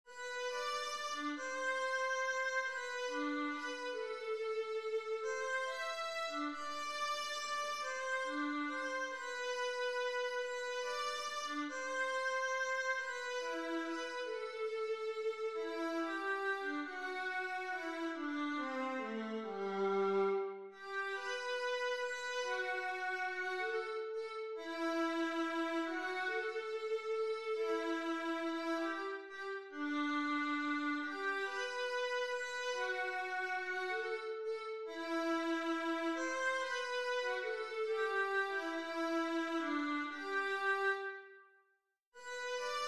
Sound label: waltz vla